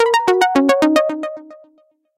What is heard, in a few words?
ladders; Snake&Ladders